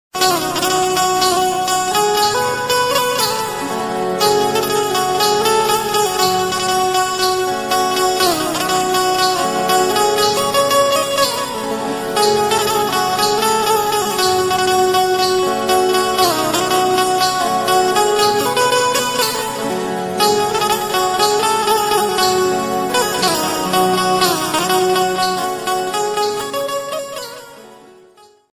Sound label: ringtone hindi bhojpuri